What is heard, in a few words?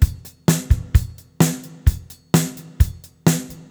129, Beat Loops; Zero-G; Monster Beats